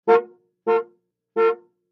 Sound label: Car horn, 1970's ext.